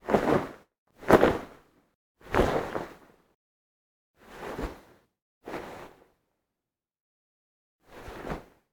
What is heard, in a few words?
jacket impact rustles; human; NS Studios - ultra sound collection